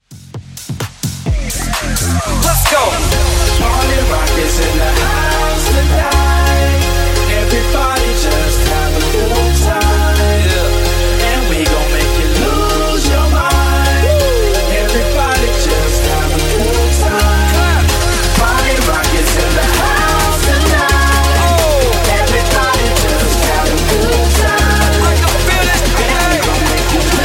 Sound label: BassHouse 130